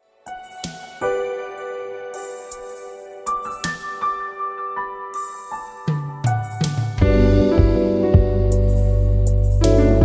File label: Falling asleep